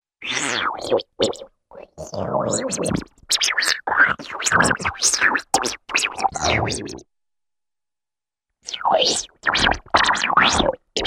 Wet Snarly Vocal-like Blurps & Growls Flatulence, cartoon; Hollywood Edge; Cartoon Trax